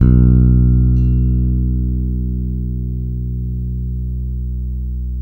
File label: 6-STR FUNK, bass; Spectrasonics; Bass Legends